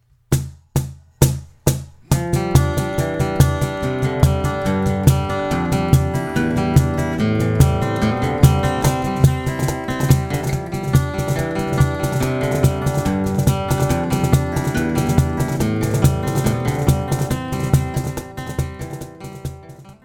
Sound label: cajon with guitar